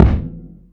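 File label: bass drum, right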